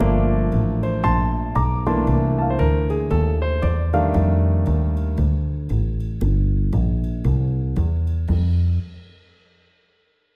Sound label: blues piano; Blues Piano Tricks